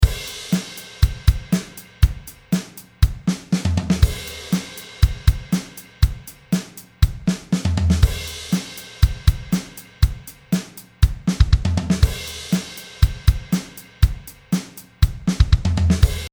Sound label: fill orchestration